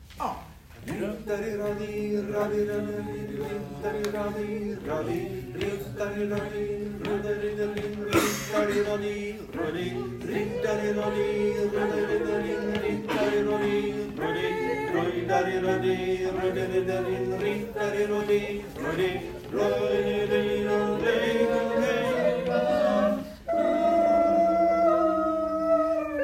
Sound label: Tenor; Raudi riddarinn uttal